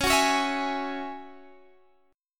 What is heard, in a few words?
F sharp 7th Suspended 2nd C sharp